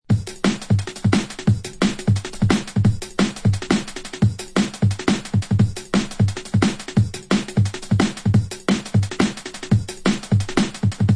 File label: Dread Rollerz Outline, beats; ray keith dread jungle breaks vol 1 60 beats for dubplate clashes battle junkies